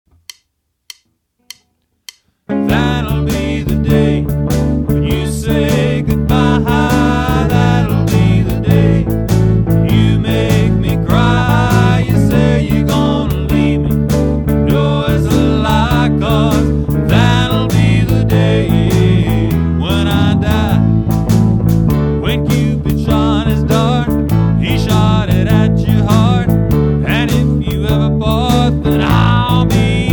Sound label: Rock Shuffle In A Fast